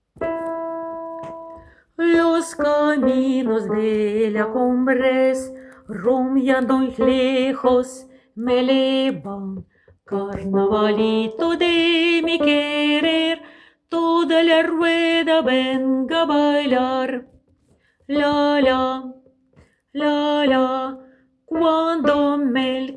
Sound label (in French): Carnavalito Alto la fin